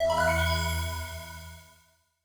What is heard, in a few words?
blow fast; CrossCode